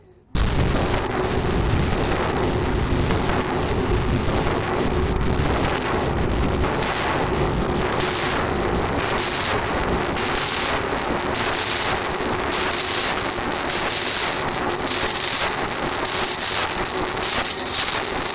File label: Synth